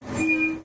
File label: dc100 whistle; tetsuon